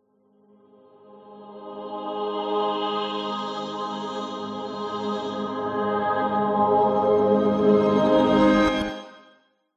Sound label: musical heavely swell; zapsplat